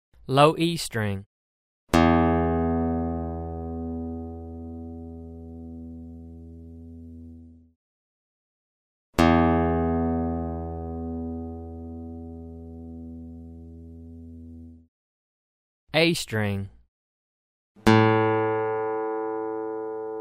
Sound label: Match Pitch Guitar